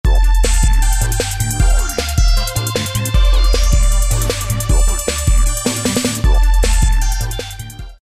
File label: New Electro, Roland D2